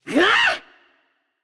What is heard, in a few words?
nv confused; cupid